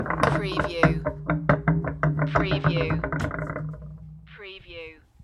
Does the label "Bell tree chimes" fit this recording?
No